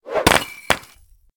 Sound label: brick break; slots; Kungfu Showdown